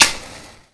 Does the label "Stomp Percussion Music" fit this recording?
No